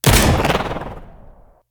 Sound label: skill breaching round cast